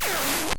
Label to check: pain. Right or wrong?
Right